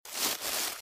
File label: Footsteps - Bush walk - Left; Eden; RadwinIsland